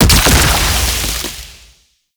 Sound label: Scaldra Pistol Alt Fire Projectile Explosion